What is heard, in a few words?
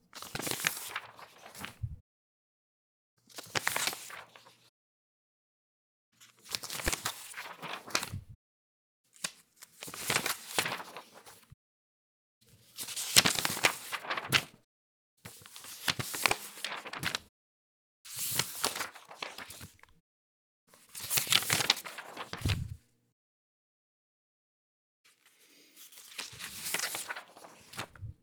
turn paper album